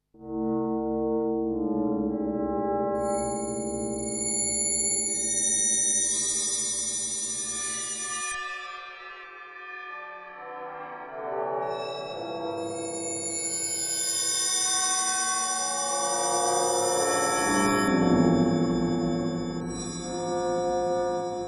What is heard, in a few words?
Tubular Abuse, Korg 05RW; This sound should have come from a wavetable synth. But it doesn't.